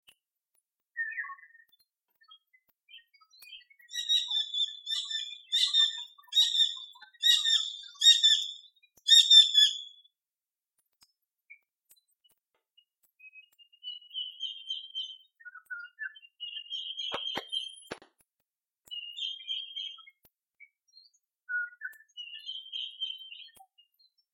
Collared Kingfisher Call; SunChongHong

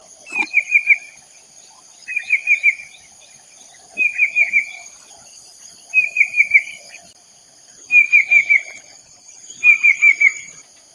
Banded Bay Cuckoo; Ipoh Perak Malaysia

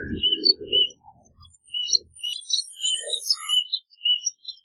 White-headed Munia calls, Ipoh Perak Malaysia

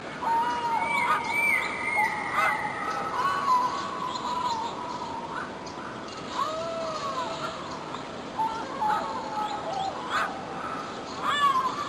Grey Headed Fish Eagle calls; Amar

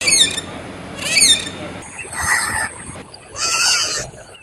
Chattering Lory calls

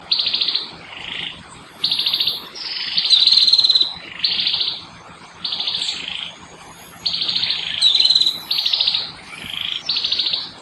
Black Necked Tailorbird adult conflict

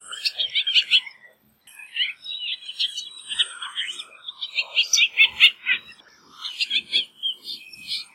White-breasted Woodswallow calls; Sandakan Sabah Borneo Malaysia

